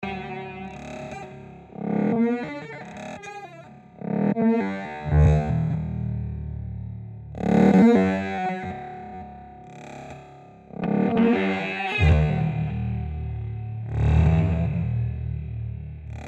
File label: manipulated drums